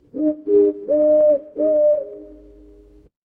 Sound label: animal owl